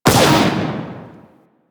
Raptor Mortar Launch; Warframe

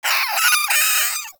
Vocal Death, Critters; Characters; Warframe; Cryptilex; Hunting Critters

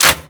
Rifle Mech; Warframe; Infested; Quanta

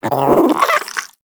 Vocal Captured Idle, Hunting Critters; Nexifera

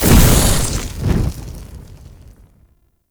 fire torch burst; Ambience; Props; Warframe; Tau; Circles Of Hell

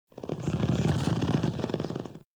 Pet Purr; Warframe; Catbrow; PetShip

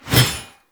Heavy Scythe Whoosh Small; Warframe; Duviri Heavy Scythe

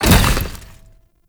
Collision Fast, Motorcycle; Warframe; Vehicles; Motorcycle Ninety Nine

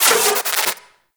Crp Arachnoid Micro Vocal Pain; Warframe

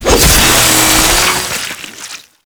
Saw Impact Flesh, Blade Impacts; Warframe; Grineer

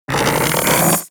Auto Crossbow Charge Up

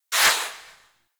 Tail, Weapons; Infested; Cernos

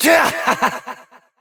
Evil Twin Doppelganger Frustration; Battle Barks; Warframe; EntratiDoppelganger

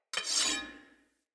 Sword Equip; Weapons; Player; Swords; Fenn Jotar Sword

